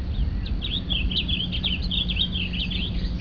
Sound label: Blue Grosbeak